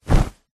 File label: foley clothing sports shorts single flap; zapsplat